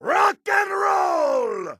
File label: rocknroll; borderlands 2; gunzerker